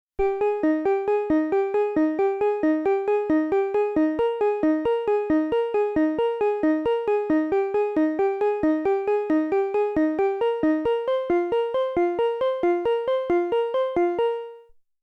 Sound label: Arp Moog One